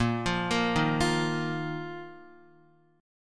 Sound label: Bb Suspended 4th Bb down Guitar; Bbsus4 arpeggiated